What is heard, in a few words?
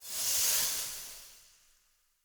stag blinding light enemy blind end; Powers; Stag Pact